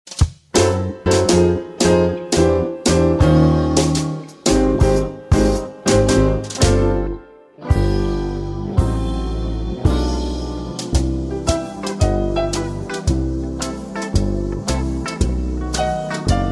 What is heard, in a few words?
brass minus